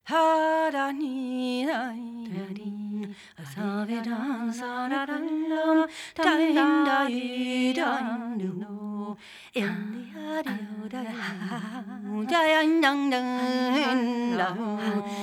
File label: Polska